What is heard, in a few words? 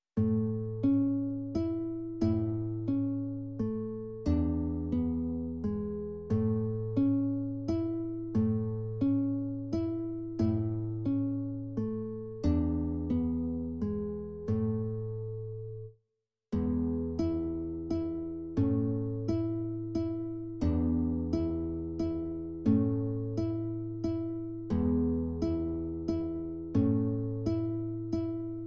carulli vals, classical guitar